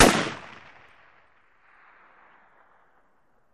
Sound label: Ak47 Sngl Shot